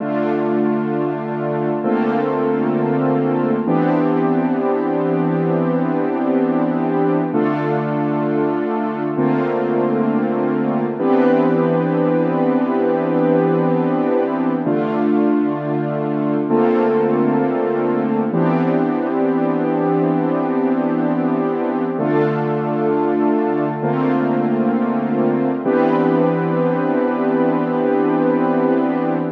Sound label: Freeze Pad